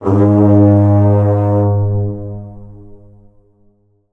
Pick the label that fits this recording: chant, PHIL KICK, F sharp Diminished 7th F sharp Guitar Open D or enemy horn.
enemy horn